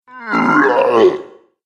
Alien Ogre; Alien Sound Effects

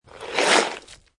Snow And Ice Ice Scrape Moderate; Sound Effects:Weather

